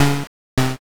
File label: cough